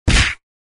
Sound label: punch